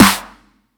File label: 909 Snare